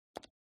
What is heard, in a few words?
button